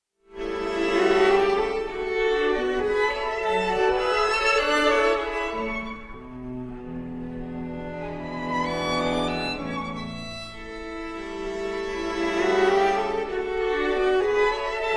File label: string quartet in C sharp minor